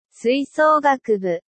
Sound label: club brass band